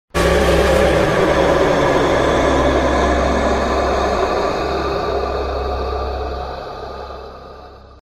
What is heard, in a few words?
scary jumpscare sound effect; not realy scary